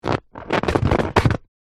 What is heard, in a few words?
Inflatable beach ball handle squeak